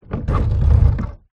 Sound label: household wheelie bin small movement on concrete ground